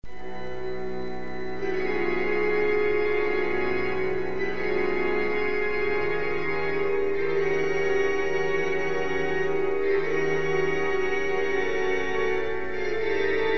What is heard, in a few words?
Toccata alla Rumba, Orgel